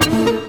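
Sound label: guitar (quirk funk); Sonic Foundry (Sony Creative Software); Ugly Remnants VOL-2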